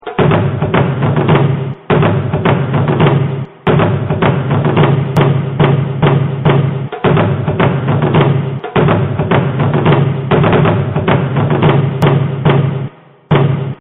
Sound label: Taiko; Zen Tones